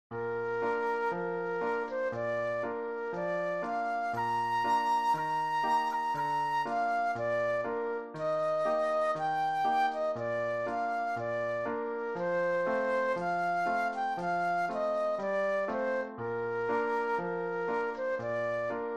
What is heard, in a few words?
flute solo; patriotic